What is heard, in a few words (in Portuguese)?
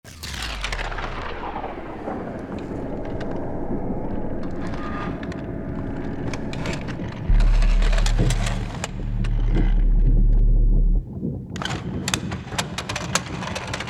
mecanica instrumental; weeklybeats 2026